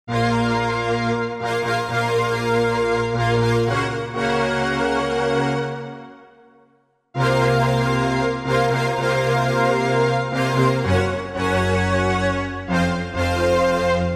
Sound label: Symphonic+, Korg MSC-1S; Korg MSC-1S Variety